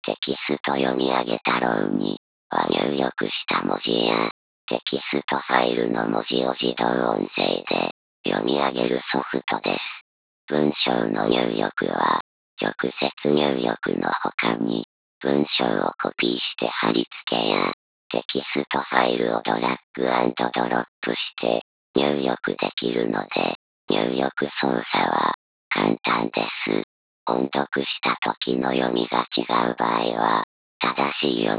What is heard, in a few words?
robot